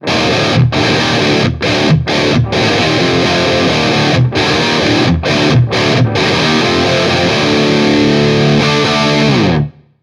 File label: Metal, ringtones; Marshall; London KB 1501